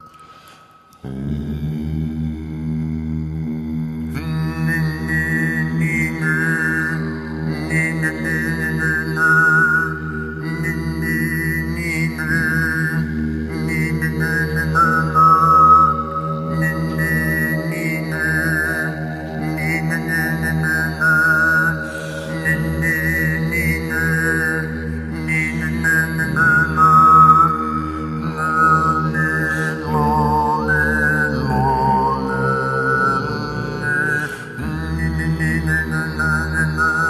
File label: overtone, songs